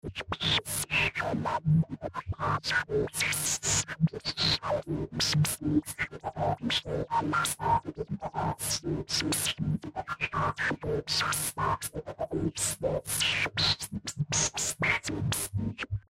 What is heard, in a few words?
Sci Fi Hiss Weird Fast Technology